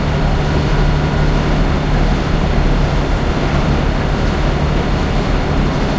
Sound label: panther engine int rpm 66, tanks; War Thunder